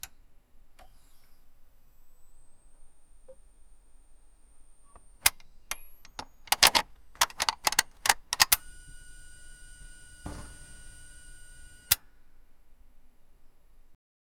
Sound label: the sound of the mech